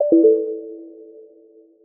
battery low; Linux; Celestial 2.1

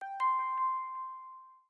Alarm; Windows

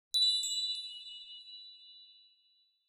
System Startup Haptic; i OS; iOS10; nano

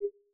focus change app icon, iOS10 UISounds

